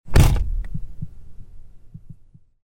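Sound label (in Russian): звуки дверей автомобиля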